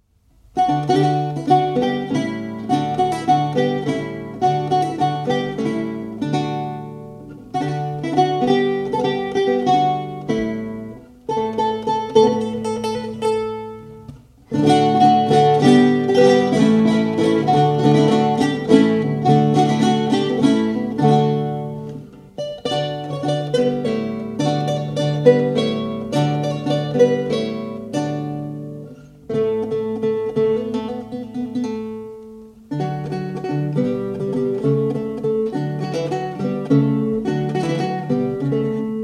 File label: Fanfare, Baroque Guitar; Making Baroque Guitar